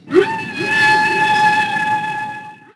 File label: Whale Call; Finding Nemo; XBOX